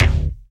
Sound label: kick bassy, dance kicks; Roland L-CDX-01